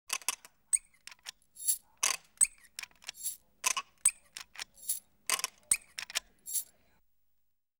Zeppelin Bomb Drop Mechanism; BBC Sound Effects Library

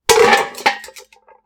metal Pot; Kenney's Sound Pack; RPG Sounds

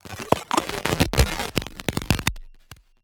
invader alien scifi transmission; Alien Creature; BluezoneCorp

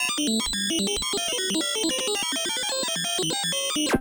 Robot Random Data Stream; soniKSound; Data Streams

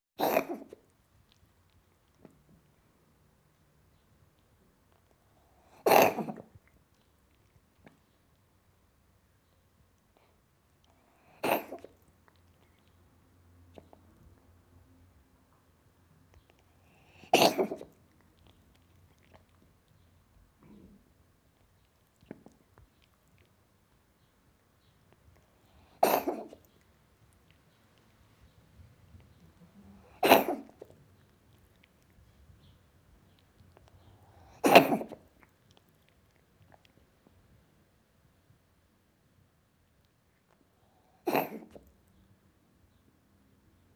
Cat Coughing; Cats; BBC Sound Effects Library

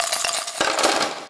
SHAKER Pieces Drop Roll; GameBurp; 2000 Game SFX

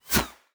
bullet flyby fast; Gamemaster Audio; Guns, Bullets and Explosions

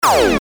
Futuristic Gun Sound; Bullet and Gun; FXHome

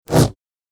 Double Bladed Saber Sound; FXHome

